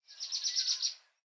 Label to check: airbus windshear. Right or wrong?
Wrong